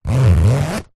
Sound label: lunchbox fabric zipper; foley